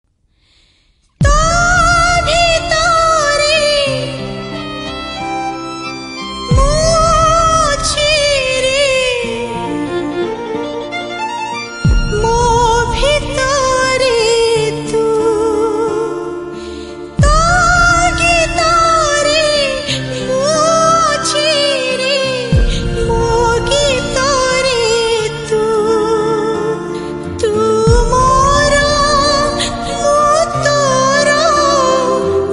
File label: Sad Female